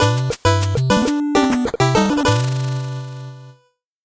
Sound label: 8bit jingle normal